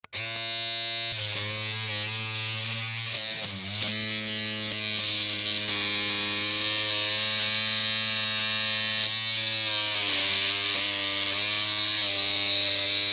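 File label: sounds of hair clipper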